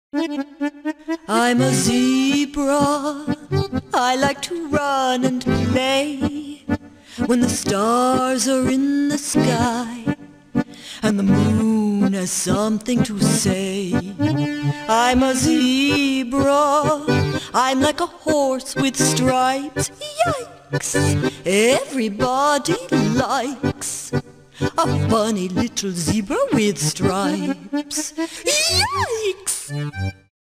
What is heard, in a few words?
Zebra Song